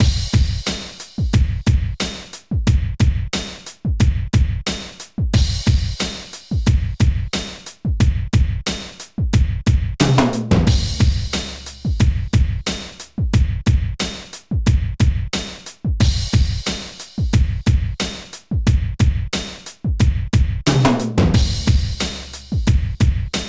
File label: ROCK; INIS; Funky Xtreams